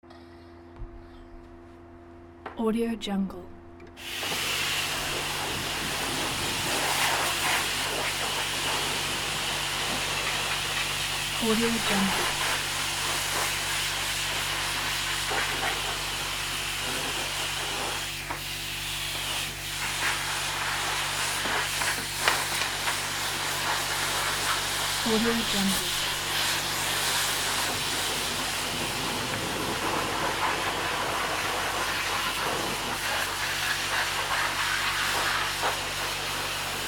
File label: car wash